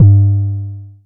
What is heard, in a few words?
analog tonal, Kickbank